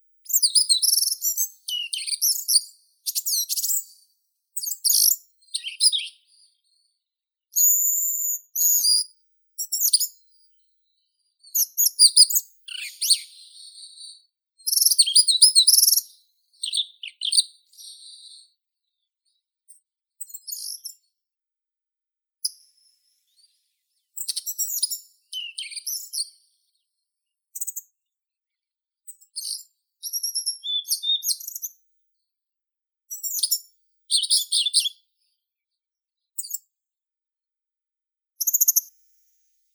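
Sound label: broad-billed flycatcher, Muscicapa dauurica; muholovka shirokokluvaya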